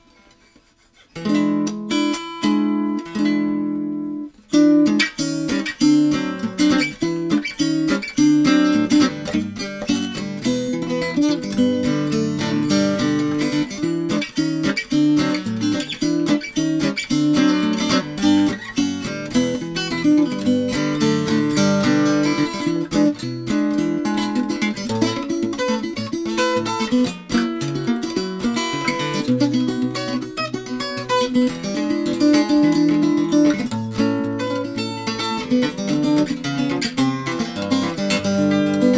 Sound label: martin r 17 mahogany r 17